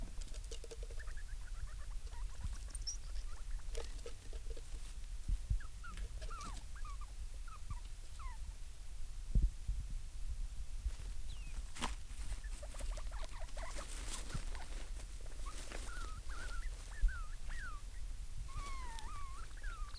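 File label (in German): Begrüssung im Nest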